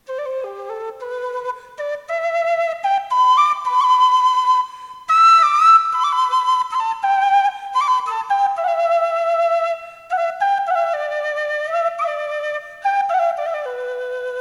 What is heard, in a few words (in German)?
quena g dur